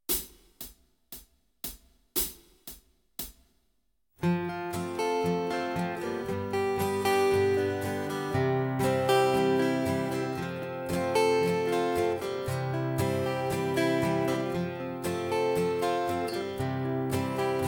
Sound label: backing track; Kenneth Baker - The complete keyboard player